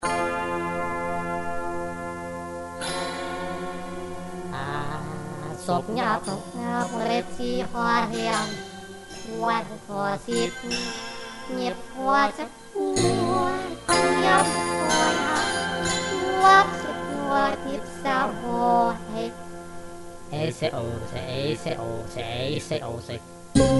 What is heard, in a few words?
Mvet like